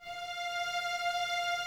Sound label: Violins F 5, String Ensembles; Miroslav Vitous; LINKS ORCH